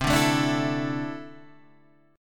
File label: D Suspended 2nd C; D2/C strummed; JGuitar